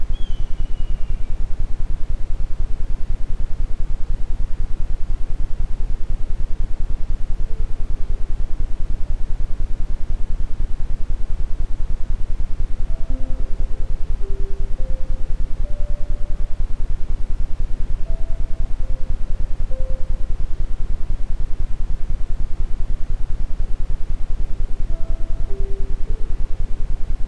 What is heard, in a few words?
isochronic, Ambient Sound; Gehirn Fokus; isochrone toene